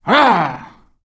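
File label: afflicted female attack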